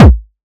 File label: dancemusic kick